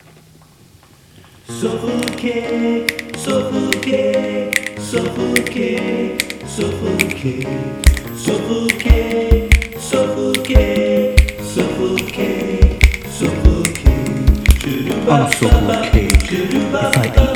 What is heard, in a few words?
perc; cake covers